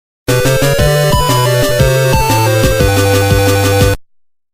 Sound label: Boxing Win-Lose